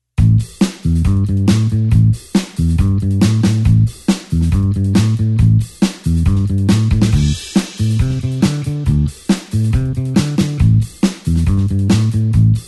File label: blues bassline 8beat A